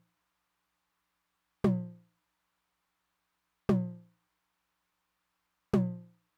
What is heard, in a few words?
high tom, Roland TR-909; drum machine; Roland TR-909 Ableton Project